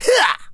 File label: attack; acheron